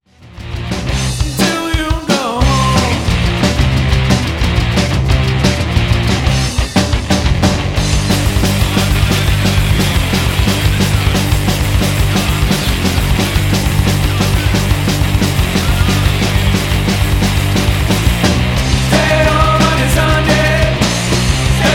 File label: Wrecked Chords